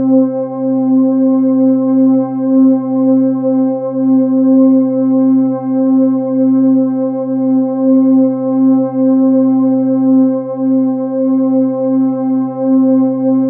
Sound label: Individual Notes Octave; color vision